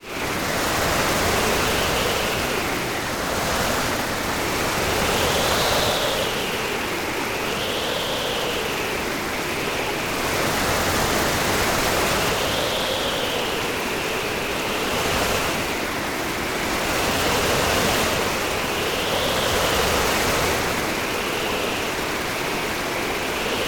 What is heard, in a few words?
sea storm